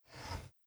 window slide away

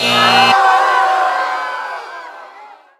goal miss

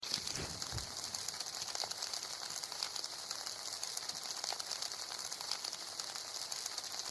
Sound of Bonfire; WorldBox

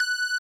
Start Ring; Rally Stars 3D

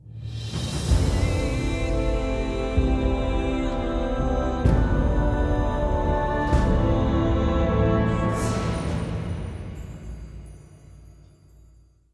UI Sound - UI BGM Area Text Rise; bless

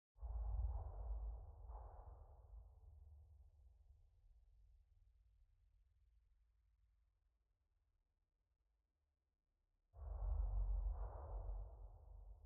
Tension Low; the last of us

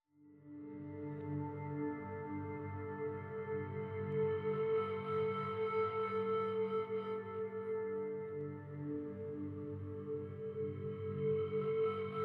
Temple Explore Mood

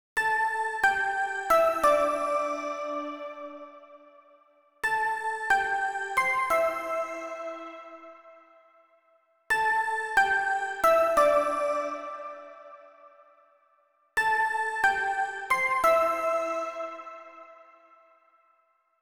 Pluck Melody; super crush k.o.

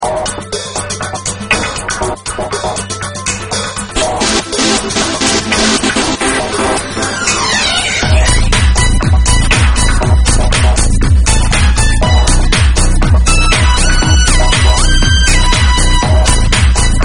Finish Music

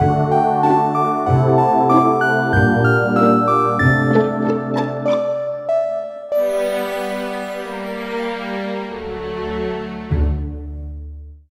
sleep long; Yo-kai Watch 1 for Nintendo Switch

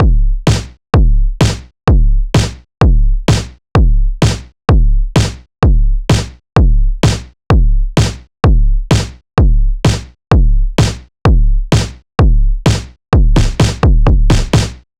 Boom Chuck; super crush k.o.

